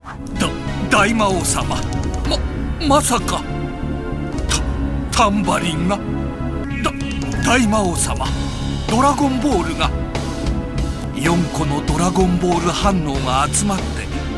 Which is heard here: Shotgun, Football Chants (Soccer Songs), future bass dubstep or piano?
piano